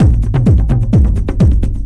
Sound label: TRIBAL, Techno Trance; E-MU Formula 4000 Series Vol. 2